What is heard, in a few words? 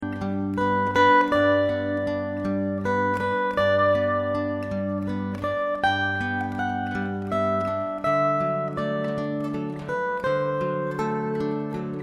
Brahms Lullaby